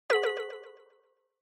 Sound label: Single Accent Delay Fast